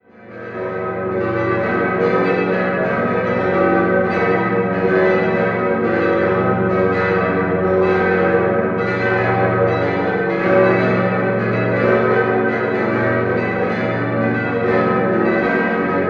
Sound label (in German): München Maxvorstadt Benno, Glocken